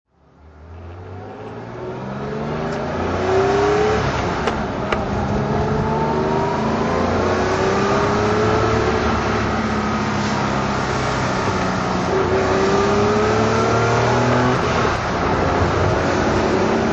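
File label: 911 20s drive, Porsche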